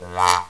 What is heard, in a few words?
wah